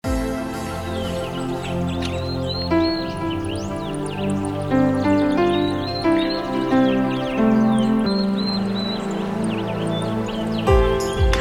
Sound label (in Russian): zvuki prirody vesna i muzyka probuzhdeniya; vesennii sbornik